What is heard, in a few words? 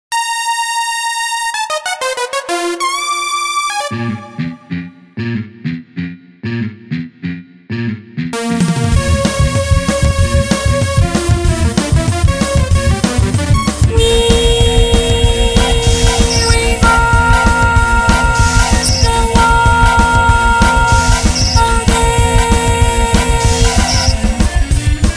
Reverse